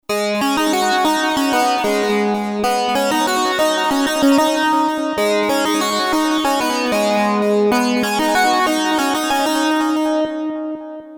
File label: synth; Creamware minimax; bob